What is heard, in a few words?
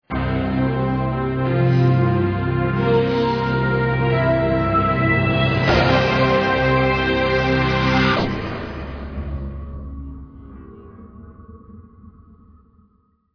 olimpic stinger ident